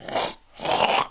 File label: idle, zombie; DayZ-Epoch; dayz sfx